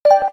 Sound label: Keytone left right; LG SV850 Wine 4